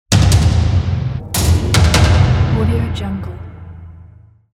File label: percussion achievement